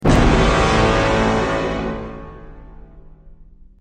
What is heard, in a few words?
Shock